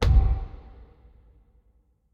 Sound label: creature click